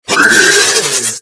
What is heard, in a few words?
lizard hit